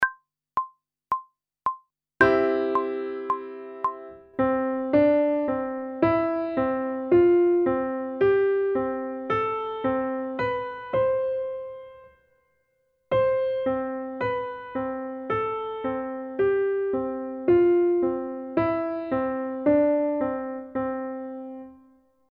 Intervals of a Major Scale one octave ascending and descending, pop vocals; grade 5; Technical Work